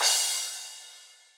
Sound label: C-Cymbals